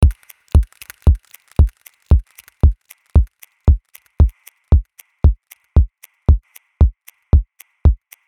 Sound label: 115 Full Drums